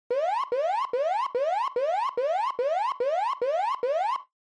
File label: Warning Siren Low Long